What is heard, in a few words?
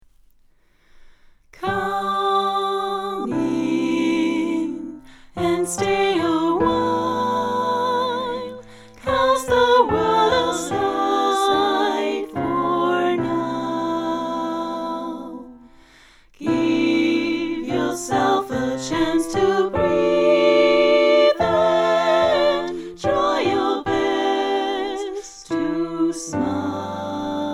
Sopran 1 come in; Singalongs